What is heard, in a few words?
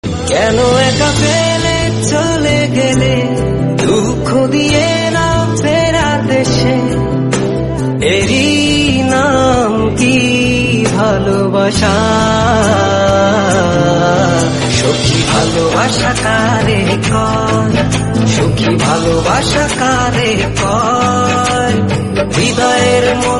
bangla folk